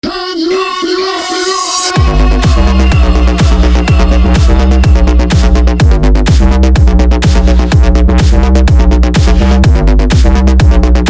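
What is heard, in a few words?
bass pipe ringing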